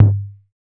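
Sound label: distorted tom; Drums In Your FACE!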